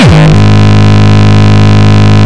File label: Longkick, kicks